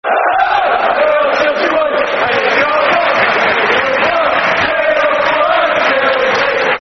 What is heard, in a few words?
Hello, Hello We Are The Chelsea Boys, Football Chants (Soccer Song)